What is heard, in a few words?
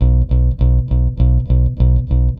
BASS, ROCK POP; MAGIX